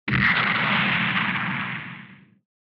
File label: Retro Anime Jet High Short